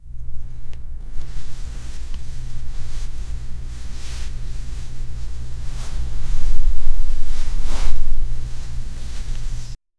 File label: hair moveing just soft